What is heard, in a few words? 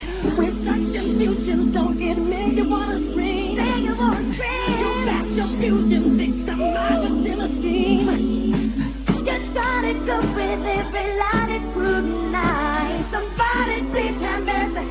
scream acap